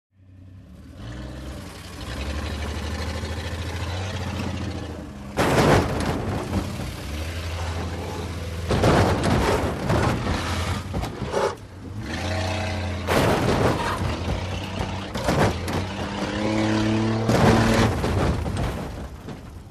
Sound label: Wood Demolition